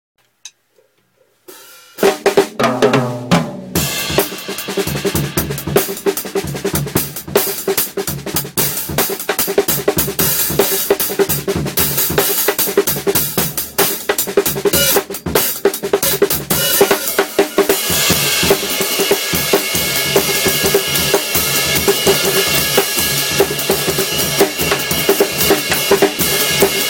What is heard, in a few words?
drum solo sample; alternative